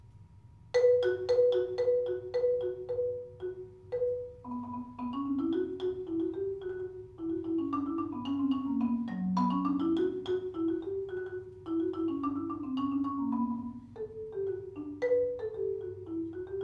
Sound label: keyboard cycle fast